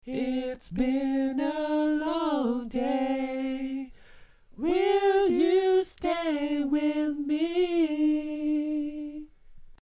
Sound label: Bari, barbershop tags